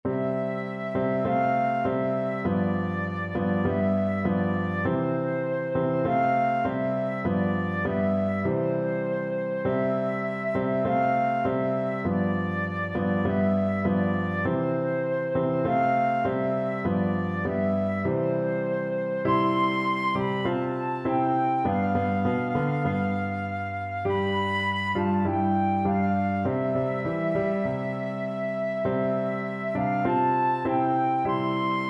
all to jesus flute

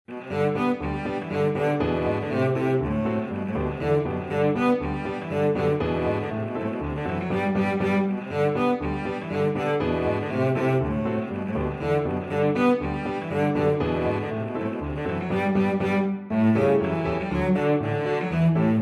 Rheinlander Polka, cello

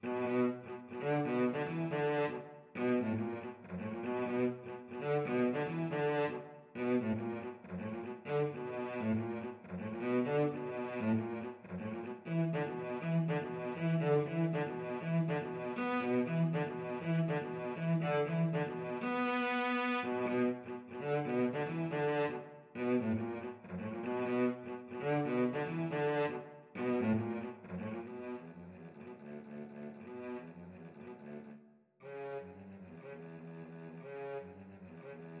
Funk Study, cello

